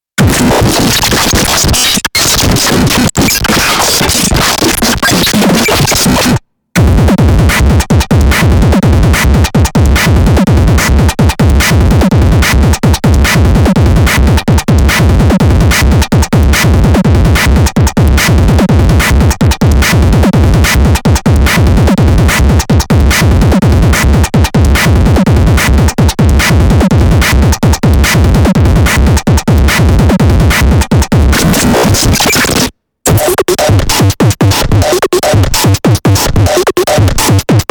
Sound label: Drum Rotator